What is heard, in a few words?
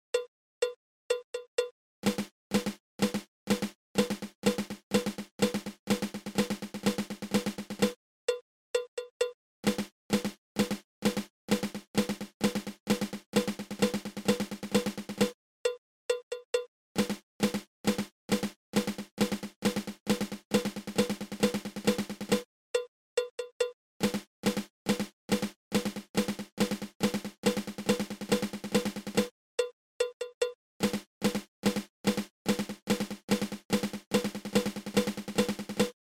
Flammed Mill